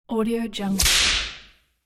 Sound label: whip